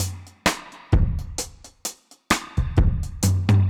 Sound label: Drums Wet 130, dub drums samples, 130bpm; musicradar